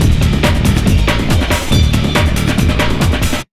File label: Big Beat Drumloops, SPEED 140